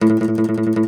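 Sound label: OUD tremolo, Plucked Strings; E-MU Producer Series Vol. 7 – Old World Instruments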